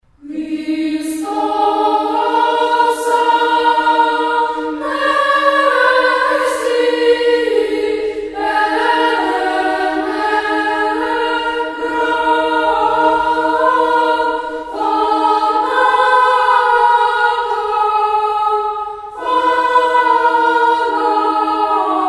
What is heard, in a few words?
children choir